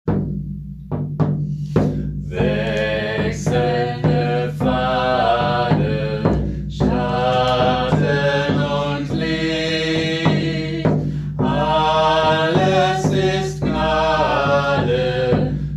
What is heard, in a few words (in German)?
Wechselnde Pfade mono